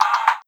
Criket Sft, Cricket

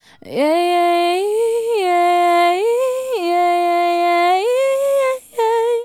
Female Solo, Hip-Hop and RnB Vocals

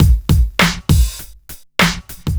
Club Tip, R&B Drums

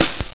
Crushed Perc, bitcrushed; musicradar

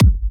Kicks, Elektron MachineDrum SPS1 MKII

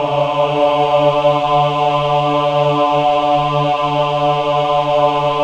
MEN AH, Choir of Ahs; Roland - LA Composer vol. 1

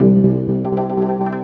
Synth Ultra Bass

